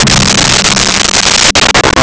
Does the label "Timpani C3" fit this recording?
Yes